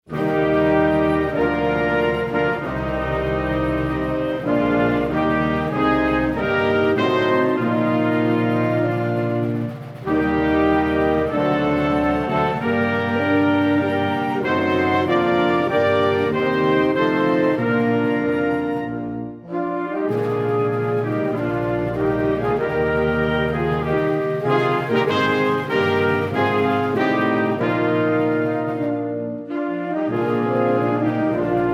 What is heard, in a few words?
anthem